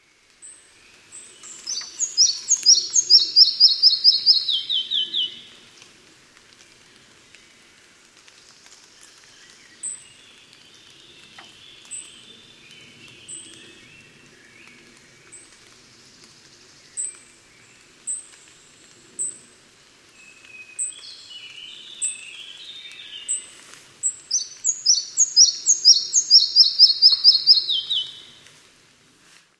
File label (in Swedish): Mindre flugsnappare